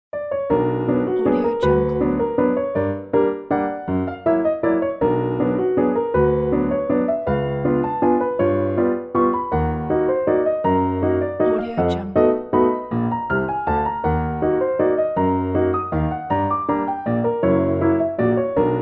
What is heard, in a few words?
halloween waltz